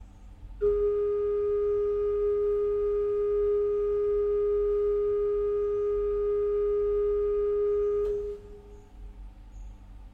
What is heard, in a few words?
bourdon 8; orgue; Positif; Ce petit orgue